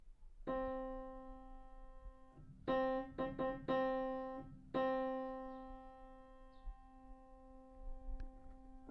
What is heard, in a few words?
rhythm 8beat, violin; rest 2 3 4; Rhythm Train Book 2